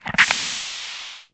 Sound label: blood